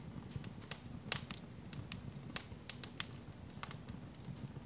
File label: Fire Campfire Loop; Destruction